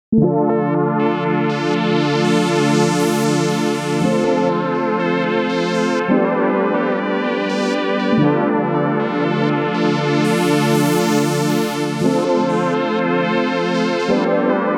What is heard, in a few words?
zero delay feedback filter